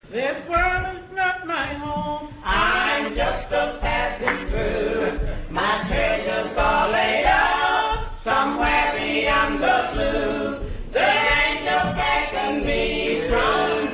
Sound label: harp